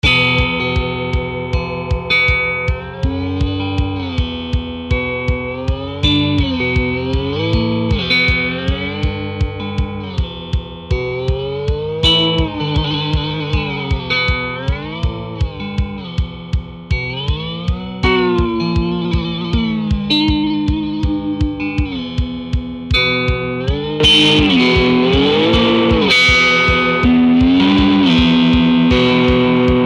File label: Nordic Blues